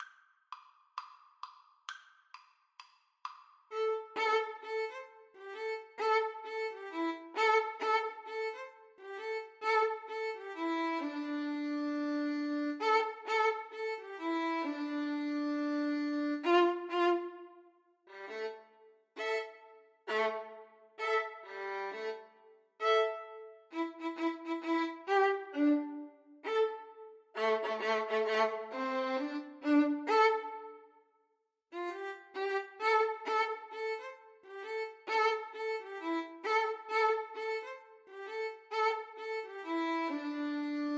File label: hip hop house, Violin Duet